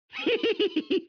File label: hehe; dota2